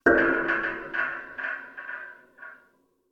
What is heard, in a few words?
Metal Impact Creak Resonant